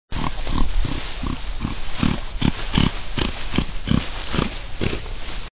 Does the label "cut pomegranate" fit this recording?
No